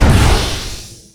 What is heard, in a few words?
shadow walk; weapons; dota; bounty hunter